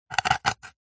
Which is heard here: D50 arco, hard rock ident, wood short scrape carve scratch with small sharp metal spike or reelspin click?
wood short scrape carve scratch with small sharp metal spike